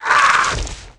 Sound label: Mutant Death; Valleakan Mutant 2; Aliens